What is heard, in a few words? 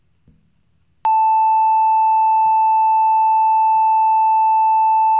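steady, siren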